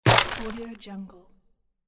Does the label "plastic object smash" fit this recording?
Yes